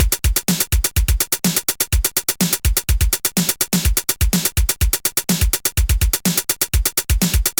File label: pinball magic loader; SoundFX 1.3